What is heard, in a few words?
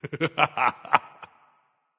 laugh; smite